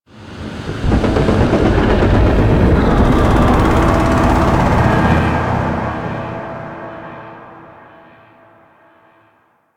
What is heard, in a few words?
Void Dragon Vocal Distant Idle; Enemies; Vocals; Warframe; Duviri